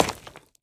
滴水石锥落地声

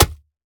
泥坯破坏声

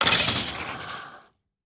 死亡声; 流浪者

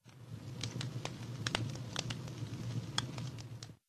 营火燃烧噼啪声

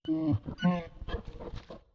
幼年北极熊待机声

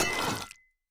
斧除蜡声

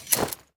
卸下狼甲声

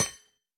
铜格栅踩踏声

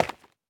滴水石踩踏声

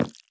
已涂蜡告示牌交互失败声

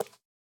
青蛙脚步声

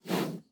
熊猫鼻息声

